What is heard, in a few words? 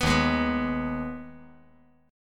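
E flat Suspended 4th Sharp 5th, chord; Ebsus4#5 strummed; 4,3,4,2; JGuitar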